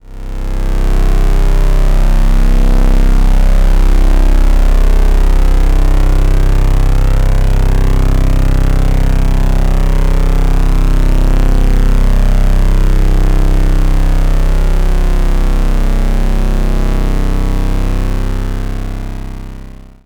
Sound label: Shepard PW low; experimental